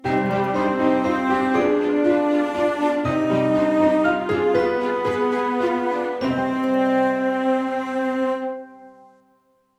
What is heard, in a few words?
game over; Corpse Party 2: Dead Patient